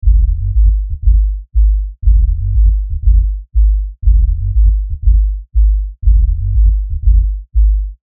drum bass High Cut

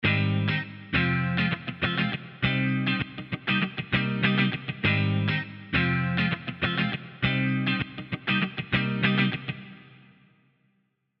clean Guitar; Phoenix

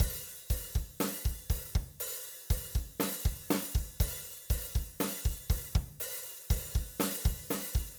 drum; Neutron

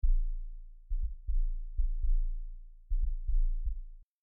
Mini V Efx VCF EMPHASIS

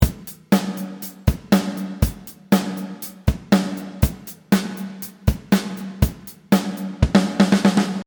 rev Snare

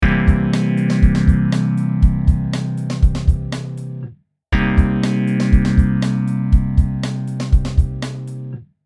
amp

